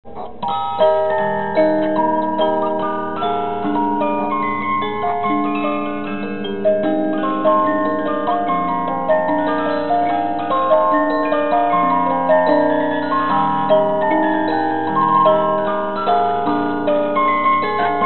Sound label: musicbox